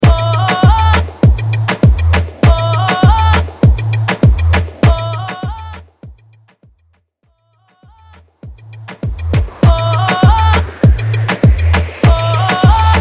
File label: Moombahton 99 Bp; Mainstream VIP